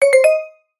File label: app alert tone